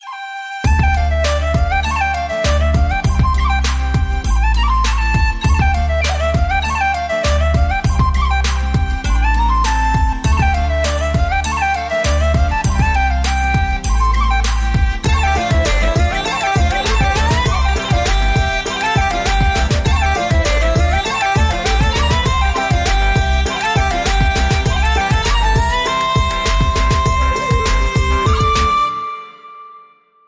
Celtic Street Hop

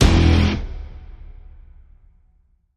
Stab Guitar Single (E)

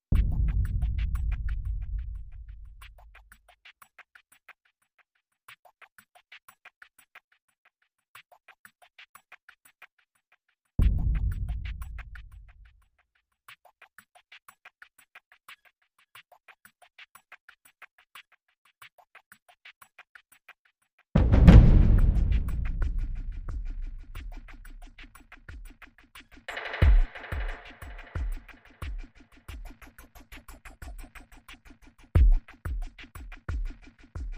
Perc Only

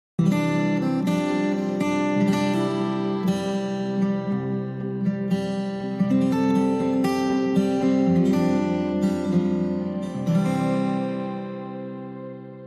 Bumper

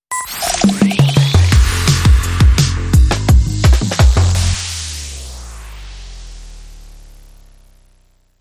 Morning Starter

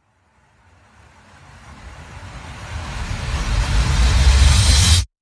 Indie Windy Reverse

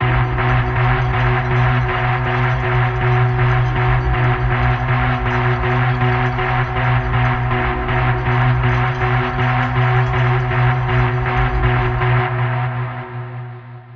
Outer Rim Alarm Pulse

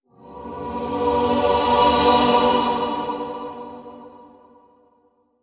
Sonic Symphony Major Swell

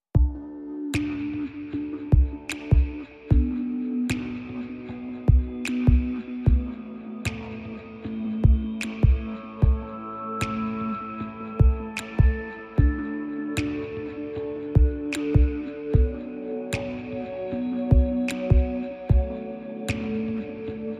Drums and Bass Only

